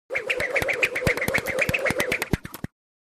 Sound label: Rope Fast Swishes